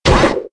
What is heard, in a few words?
locked; itgmania; stepmania; MusicWheel